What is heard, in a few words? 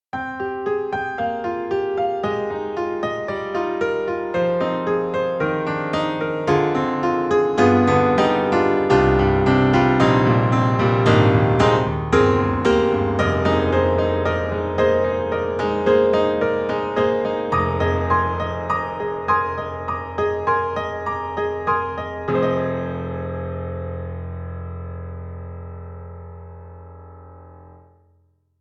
Model D Close Piano and Ballroom; NY; Steinberg The Grand 3